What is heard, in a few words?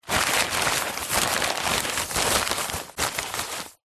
foley paper pile loose movement